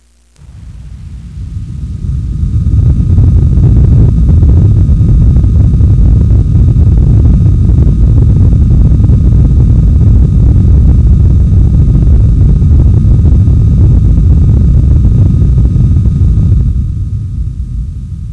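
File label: earthquake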